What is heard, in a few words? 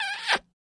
Med. Kiss